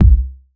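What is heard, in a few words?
bass drum; Wizoo - Platinum 24 Electronic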